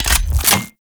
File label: Spear Gun Reload; Weapons; Warframe; Tenno; Hoplite